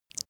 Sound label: smack short; soundpool